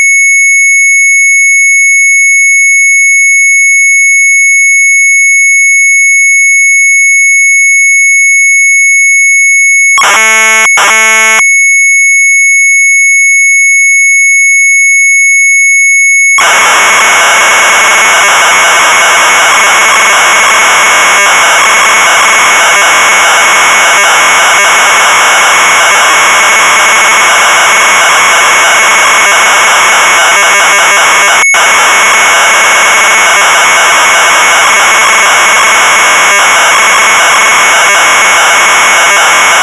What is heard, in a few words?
MZ800 Tape; CMT SOMMEN; sharp; retromelsarchive